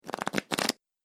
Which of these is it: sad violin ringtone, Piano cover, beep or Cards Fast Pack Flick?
Cards Fast Pack Flick